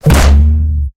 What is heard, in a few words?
gui bns fail, tanks